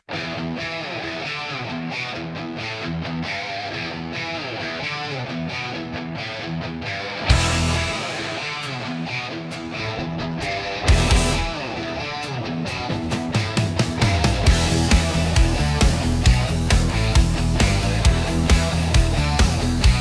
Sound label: Heavy electric guitar riff crashing; Heavy electric guitar riff crashing cy